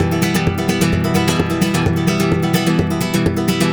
130, 130RUMBA2, Spanish And Gypsy Traditions; Soundscan vol.59; AKAI

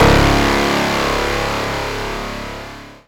C#, Synclav FM PLUCKED; Big Fish Audio

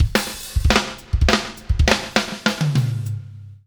Fills, 103; Zero-G; Monster Beats

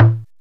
TUMBA; Greytsounds VOL-1

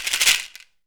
SHEKERA, African perc

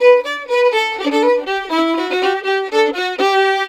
violin, Celtic Flavours

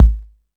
Toms 1, drum machine

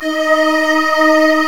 FAIRLITE G4; Northstar - New Gold VOL-1; M1 FAIRLITE

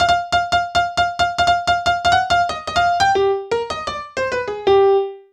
Piano Solo, Cinematic Funk

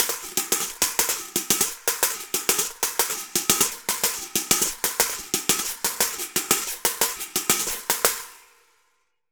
Reco Reco Samba 120 bpm; Best Service; Festa Latina VOL-1

